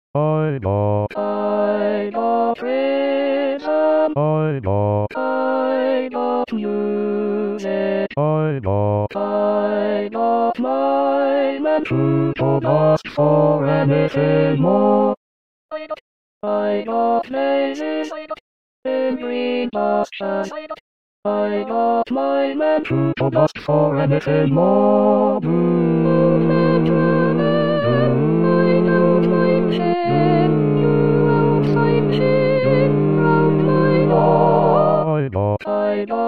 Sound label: - Bass